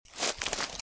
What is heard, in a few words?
Footsteps - Bush walk - Right; Eden; RadwinIsland